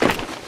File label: gear, metropolice; Garry's Mod; hl2